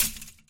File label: chainlink tap; scripted sequences, a2